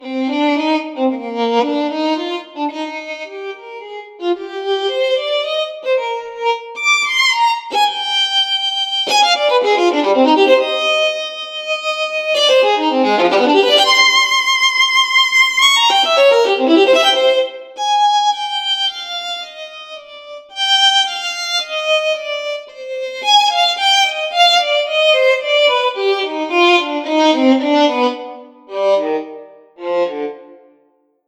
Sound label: Brahms Violin dynamisch; Chris Hein